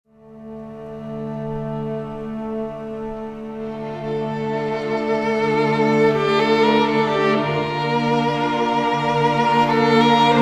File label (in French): triste violon solo